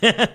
very short laugh

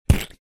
making a plopping sound

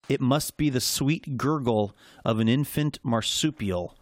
sweet gurgle infant marsupial

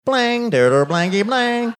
mouth guitar sounds

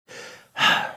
exaggerated sigh

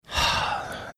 sighing

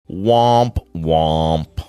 whomp whomp

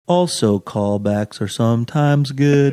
singing also callbacks are sometimes good

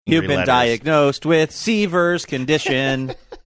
singing youve been diagnosed with seivers

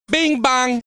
bing bong; sidetalk

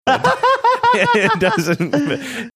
laughing

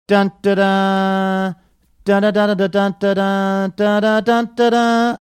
singing studio fanfare; 20th century

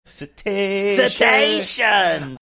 singing cetacean

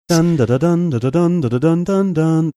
singing contemplative version football anthem

